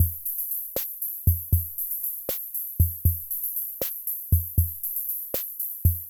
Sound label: Loop, classic machines, rhythm77